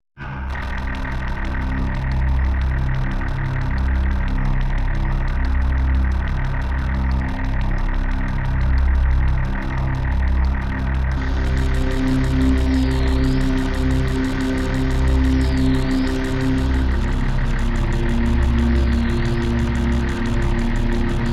Emulator X3

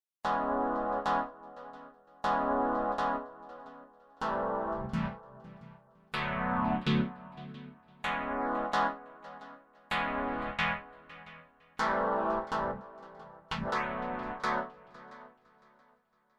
solo chords; Kontakt7

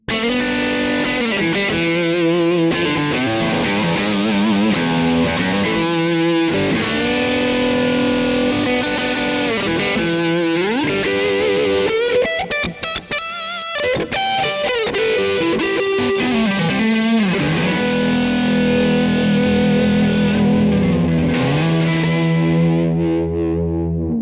Boost; Kemper Profiler Player Alchemy Mercury; Rig Exchange

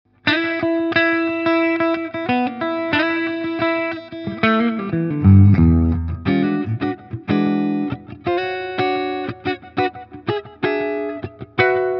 Supro Delta King 8 Combo clean. Boost off; Gain 10h

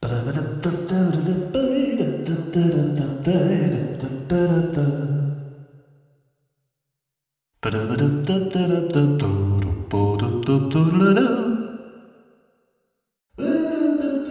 funny jazz impro

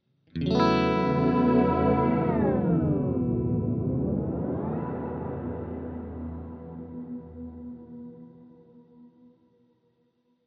WALRUS LORE Dive

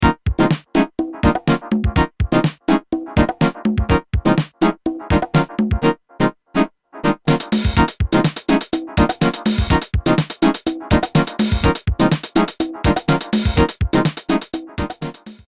MFB Test Chords

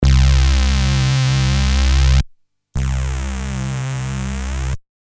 VCZ vs CZ

